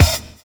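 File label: OLD GROOVE; Univers Sons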